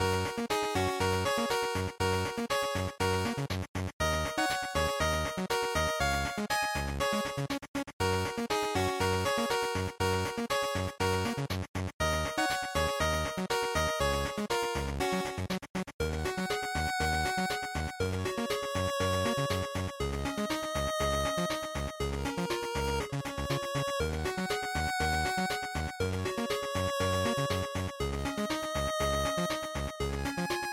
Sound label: GB General Title Loop 120